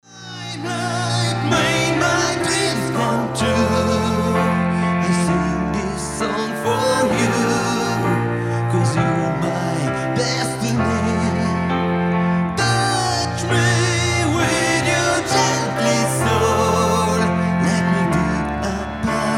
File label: Acoustic Version